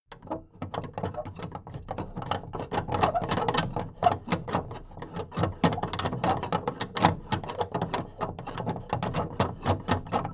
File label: big plastic case movement handle fast